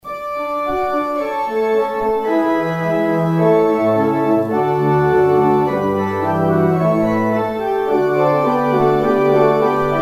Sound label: organ outro; Organ exit music